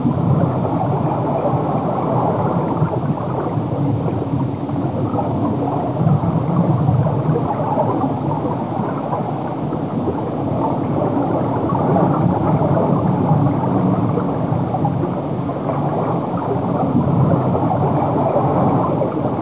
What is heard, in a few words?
water